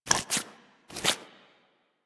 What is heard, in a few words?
Foley Idle Alert Lick Leg; Enemies; Kubrow; Warframe